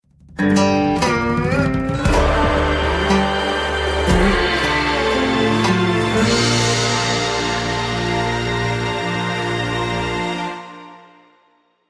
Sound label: Chinese Win